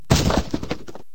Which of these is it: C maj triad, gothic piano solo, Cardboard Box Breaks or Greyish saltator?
Cardboard Box Breaks